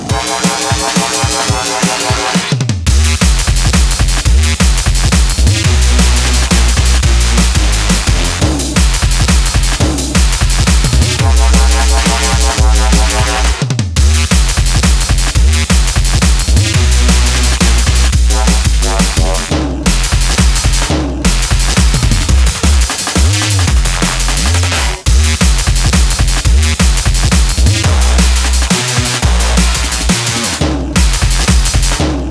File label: music; jail concrete widow